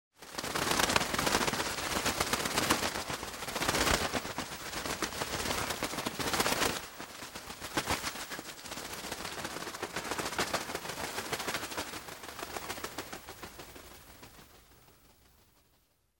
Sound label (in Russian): zvuk krylev pticy v poete